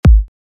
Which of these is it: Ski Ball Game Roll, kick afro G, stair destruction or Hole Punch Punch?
kick afro G